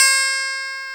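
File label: guitar Strat, Brite Strat, clean electric guitar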